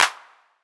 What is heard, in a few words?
perc classic clap